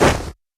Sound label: damage